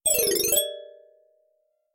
Reset Button

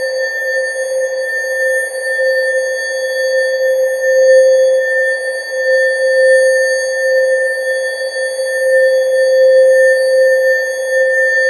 Force C3 Pad Glass; gravity-force-lab-basics

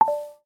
Dropdown Menu

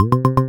Bass Pong; ohms-law; Current

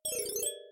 Reset Button speed

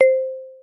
marimba C4

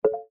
Edge Boundary Phet Tones; phet io wrapper sonification; gravity force lab basics

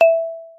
marimba E4

